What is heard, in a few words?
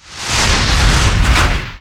wind magic; Pc Skill; FlyForInsanity